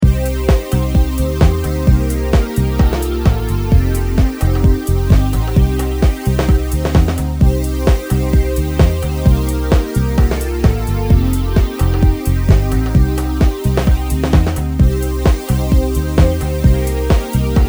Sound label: beat